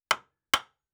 Nail; Fantasy Sounds Bundle; Craft

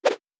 Whoosh; Fantasy Sounds Bundle

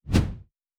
Whoosh; Fantasy Sounds Bundle

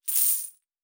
Coins; Fantasy Sounds Bundle

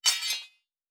Weapon UI; Fantasy Interface; Fantasy Sounds Bundle

